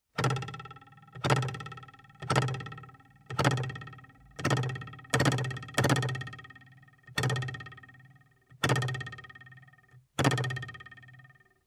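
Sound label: ruler sound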